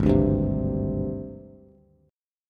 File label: Ab Major 7th Flat 5th Ab, down, Bass; strummed; JGuitar